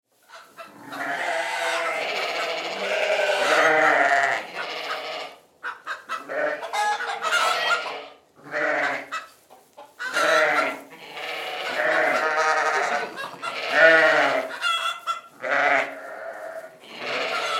Barn Ambience Sheep, Barn Ambience Sheep Roosters Short Farm; Farms; Animal